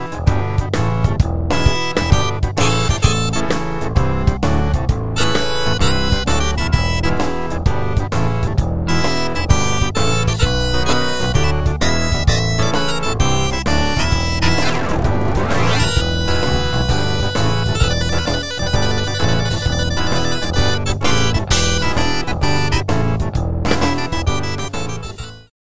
Rock Organ; Harmodion; Syntheway

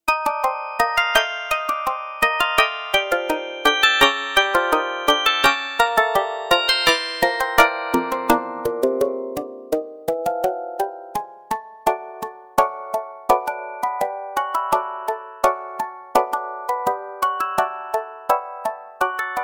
Milky Way Organ; Harmodion

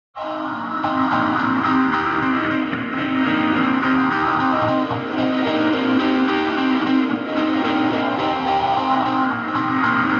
Sync Bounced Delay Wet; Echo Chamber; Echo Chamber is a stereo reverb and delay plug-in; Syntheway

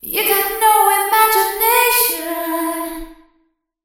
Spring Reverb Female Vocals Wet; Virtual Room Reveb; Syntheway

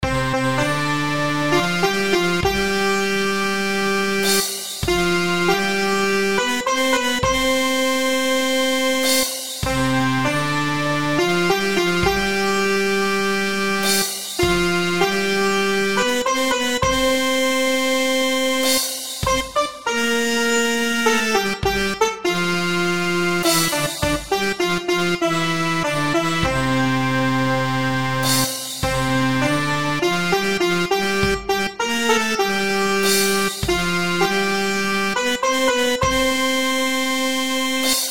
Indian Virtual Sitar, Harmonium; VSTi; Syntheway

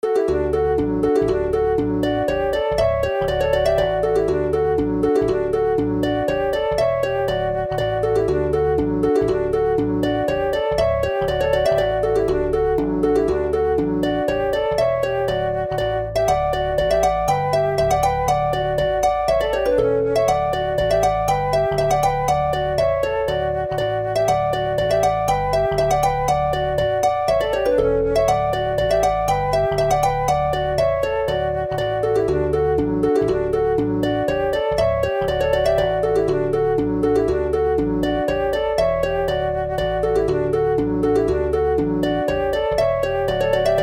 Virtual Harp, Flute; VST; Chordophonet; DAL Flute; Syntheway

